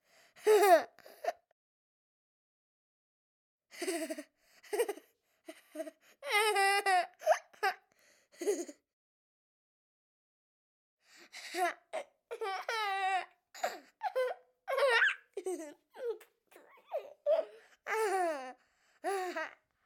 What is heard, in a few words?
Laughter